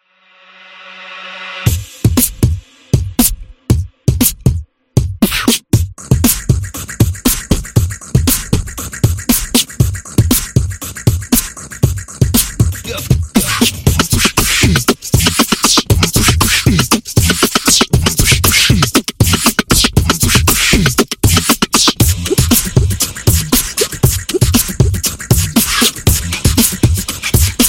Beatbox

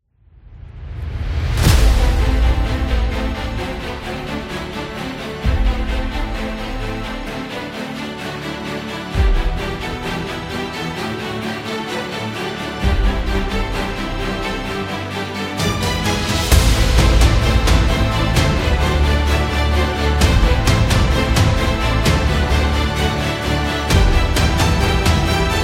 Heroic

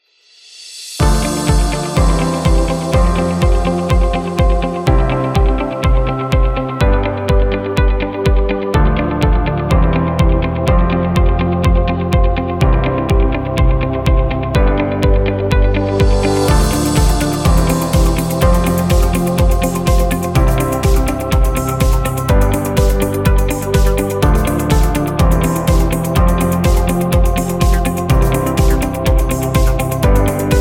Corporate Business

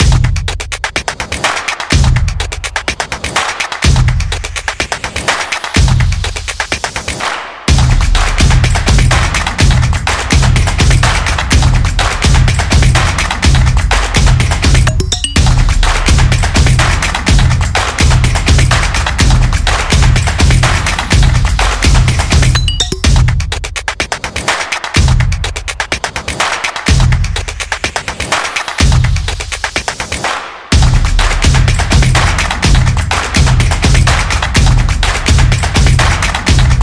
High Energy

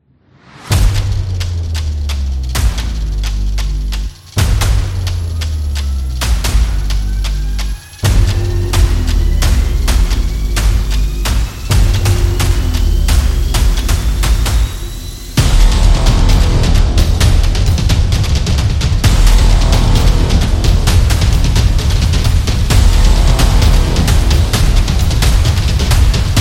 Epic Drum

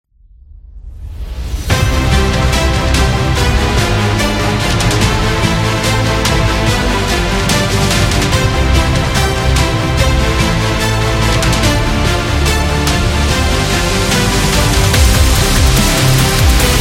Epic Win

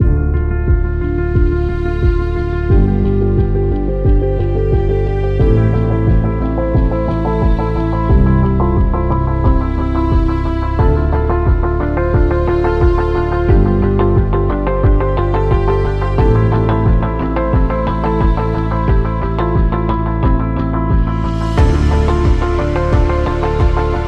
Educational; explainer

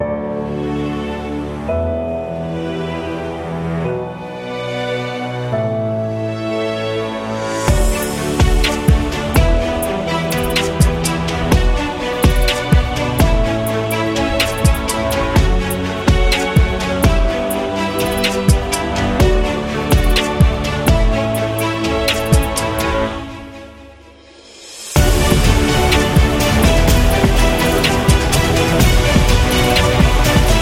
Hip Hop Cinematic; corporation

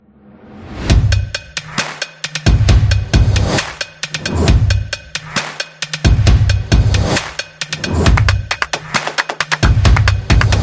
Drum Music

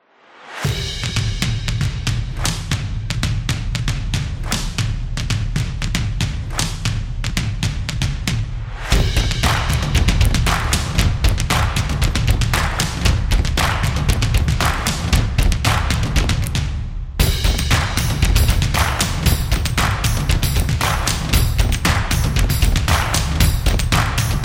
Sports Beat, sport drums

